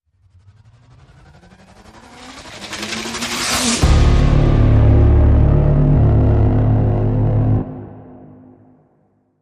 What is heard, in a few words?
Hit Streaking